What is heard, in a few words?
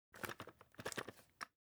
movement sniper; gmod; arccw uc commons attachments